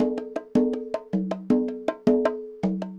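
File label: congas bongos 80 bpm, latin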